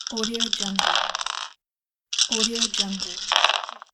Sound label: the dice; fx-library